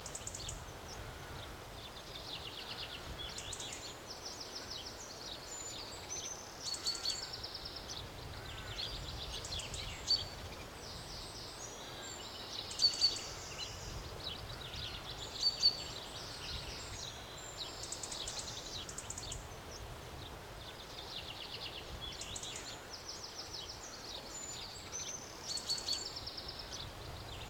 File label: FARM YARD MORN